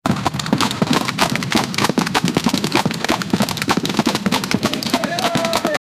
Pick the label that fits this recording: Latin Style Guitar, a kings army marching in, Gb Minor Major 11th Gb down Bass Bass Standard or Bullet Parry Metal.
a kings army marching in